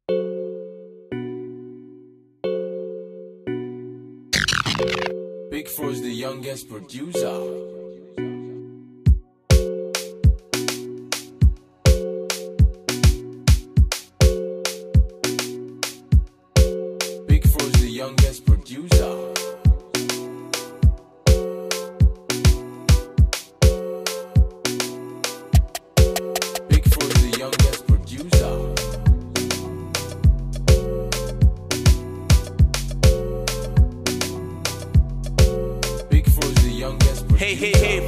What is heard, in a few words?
Instrumental Beat